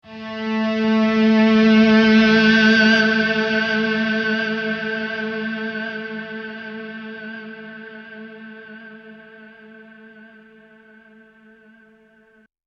Fake Guitars wet

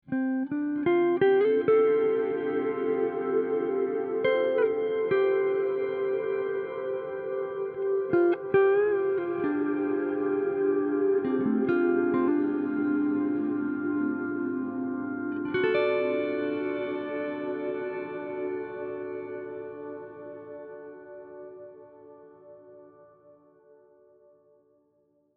Guitar Planetary

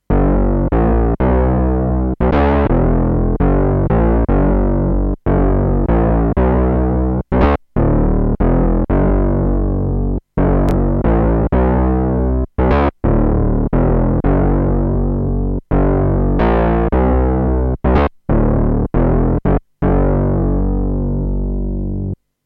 Bright Bass

